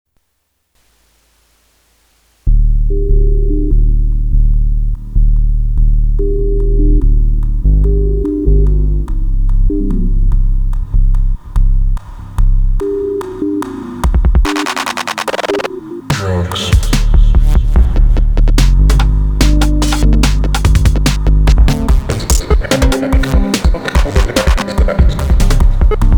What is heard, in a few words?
electronics robotics slower